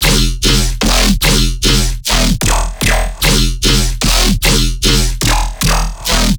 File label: Bass Shot E 150, dubstep; Dubstep Basses; Loops; Slush; GhostHack; Advent Calendar 2021